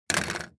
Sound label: christmas present tape gun roll set down drop; esmteam